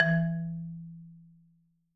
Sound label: E2, Marimba; hard F; Sampleheads - Dave Samuels Marimba & Vibes